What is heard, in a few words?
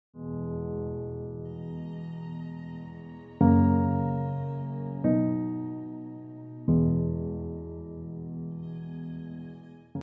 tempo low, music